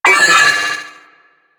Trooper Vocal Pain; Warframe; DE; Sentients

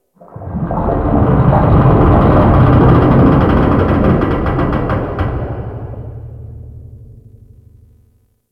Ocean Hull Creak; Warframe; Grineer Ocean

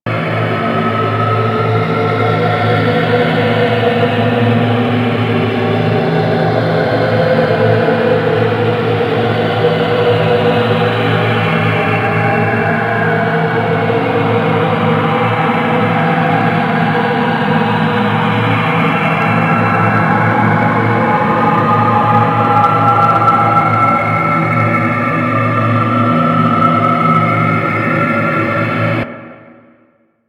Space Trench Run Amb; Ambience; Space Battles; Exterior; Warframe

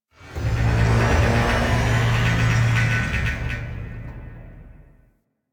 Ship Energy Rumble; Warframe; Corpus Ship Remaster

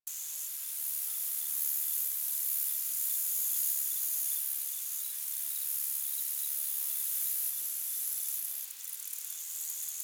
Insects High Loop A; Ambience; Props; Loops; Warframe; Eidolon Plains

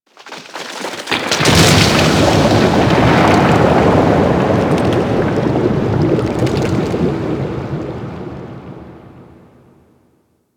Ocean Cave Crack Large; Ambience; Warframe; Grineer Ocean

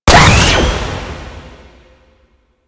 Laser Disc Gun Fire; Moa Disc Laser Gun

